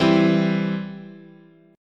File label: E Minor Sharp 5th, chord